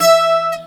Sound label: C GTR HARD E4, Celtic Flavours; USB Soundscan vol.42; AKAI